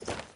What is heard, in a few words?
Step Normal